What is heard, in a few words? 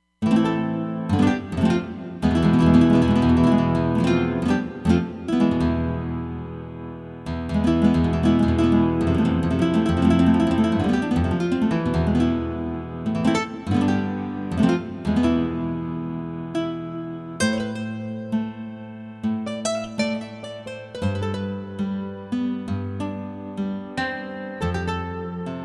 Flamenco Gt X, Roland Fantom XR